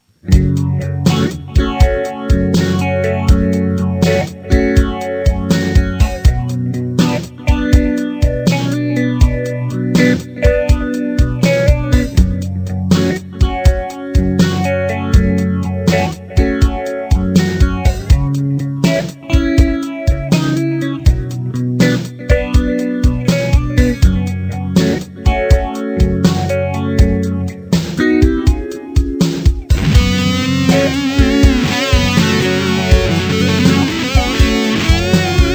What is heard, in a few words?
slow blues